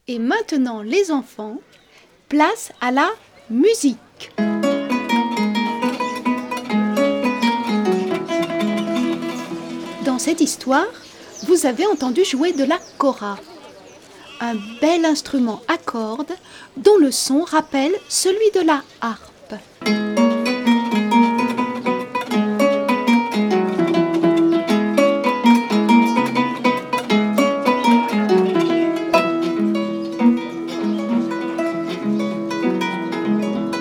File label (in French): La kora; Studio les Trois Becs